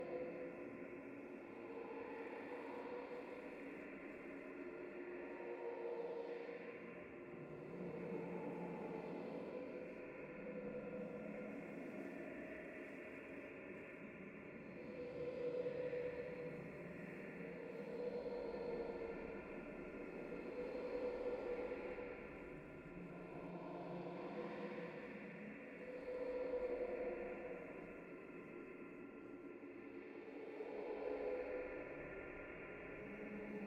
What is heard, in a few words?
Dark Ambiance Loop